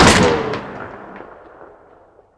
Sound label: tree; weapons; deadshot; dota; muerta